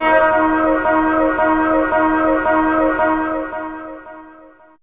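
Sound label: Orchestra 2 C4, Fairlight CMI IIe; TremStr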